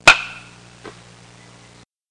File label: Hitting Baseball